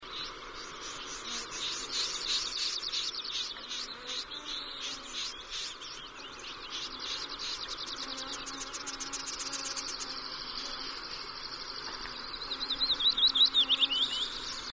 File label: Galste, Linnut Kahlaajat kurpat; Koshim